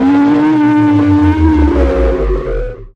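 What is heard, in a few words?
Creature Injured Robot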